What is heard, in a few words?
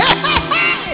hahaehei